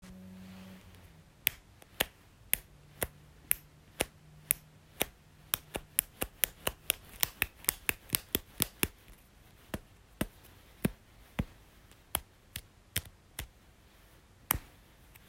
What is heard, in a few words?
Hair Clip